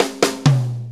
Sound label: 4-4 Fill, Drum Loops; Sonic Foundry (Sony Creative Software); Loops & Samples Drum Tools